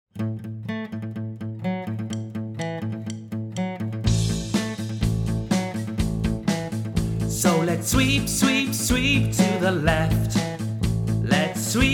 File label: Cleaning Song; Pinocchio